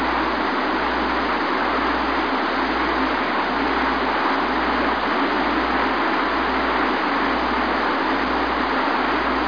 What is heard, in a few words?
Rapids Background